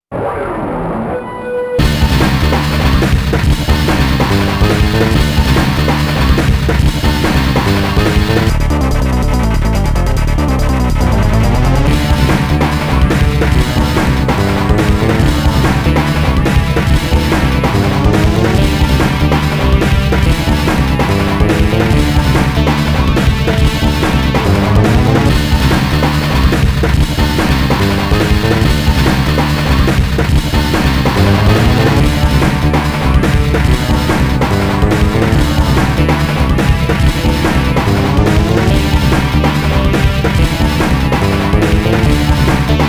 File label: TRANCE; the king of fighters 2002